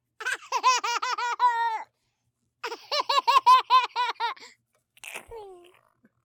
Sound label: Child Laughing